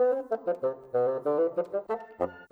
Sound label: Bassoon, Orchestral; Sonic Foundry (Sony Creative Software); Orchestral VOL-2; Modern 29